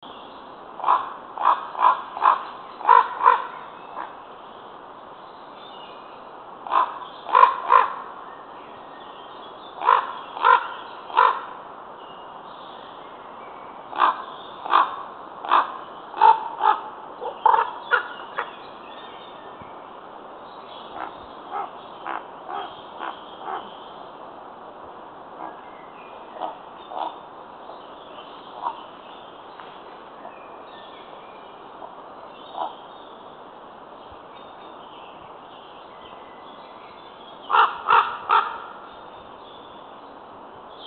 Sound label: Common Raven